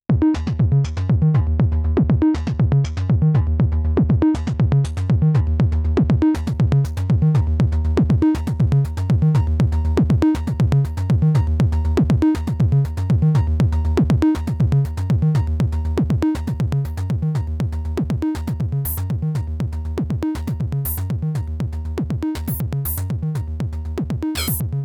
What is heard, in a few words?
mopho seq indus; davesmith mopho